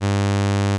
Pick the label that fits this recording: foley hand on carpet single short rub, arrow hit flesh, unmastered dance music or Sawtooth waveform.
Sawtooth waveform